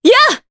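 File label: Vox Attack Japanese; Maria; kings raid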